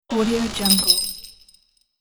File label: store bell ring